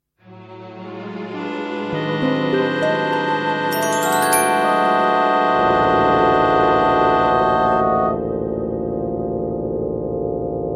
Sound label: Melancholic Songs instrumental version